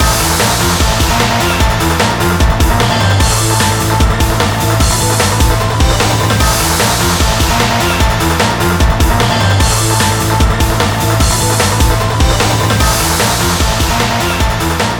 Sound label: Burning-Hot Beats